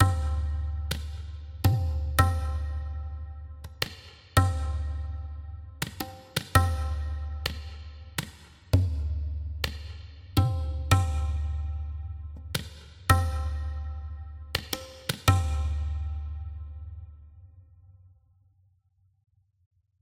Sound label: Stealth Tabla; Sarco; tintin reporter cigars of the pharaoh